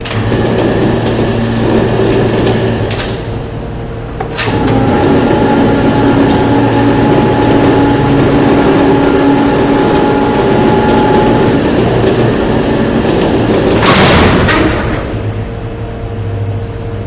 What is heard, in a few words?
Elect Prison Door, jail & prison doors